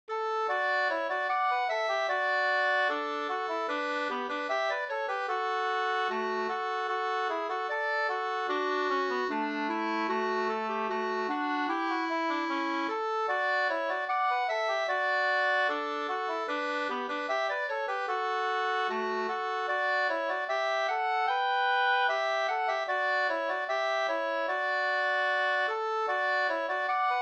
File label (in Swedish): brudmarsch; av Torbjorn Eriksson